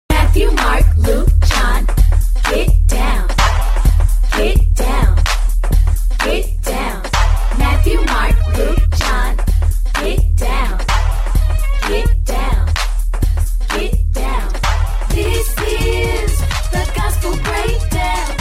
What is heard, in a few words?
Gospel Break; REFORM samples